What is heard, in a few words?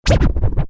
Turntable Scratching; DJ (Sound Effects:Musical); Lynne Music